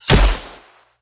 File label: glowsword